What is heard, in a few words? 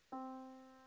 Yamaha Disk, piano sound